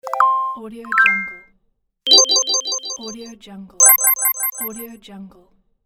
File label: reward sounds